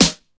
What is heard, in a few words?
dry steel snare drum sound a sharp key, snare drum sample tuned to the A# note